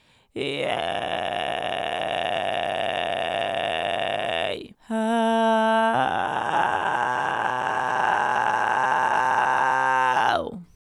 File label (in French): creaking irregulier m1 compresse; les effets simples